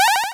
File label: powerup, Retro Sounds 32-Bit